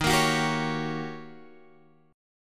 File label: Eb 7th Sharp 9th, Eb, Guitar, Open G; strummed; x,8,8,11,8,11